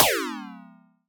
Alien FM Perc - Med, Augmented Percussion; Ableton; Noise Garden - Augmented Percussion; Alien FM Perc Vol. 1

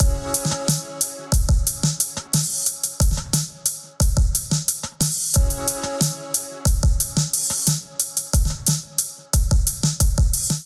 Collective Species Kit Melodic 90bpm, Drums; MIDI Clips; Drive and Glow; Ableton; Factory Packs

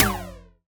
Alien FM Perc Short

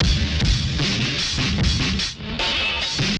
075 Chill Pop Dist Drums; Pop Rock

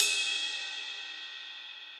Ride Mid Old School; Drums; Drum Hits; Standard Hits; Ableton; Session Drums Studio

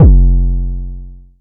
analog tonal, Kickbank; mpk mini presets; Ableton Foundation FreePacks

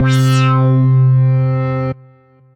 Honey Pulp Lead, Synth Lead; Ableton; Factory Packs; Chop and Swing

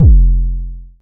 analog tonal, Kickbank; Ableton Foundation FreePacks

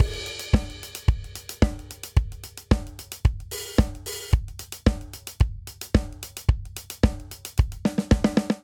Classic Disco Chorus 111 bpm; 4 4; MIDI Clips; Ableton; Factory Packs; Drum Booth

